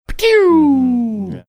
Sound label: making bullet ricocheting sound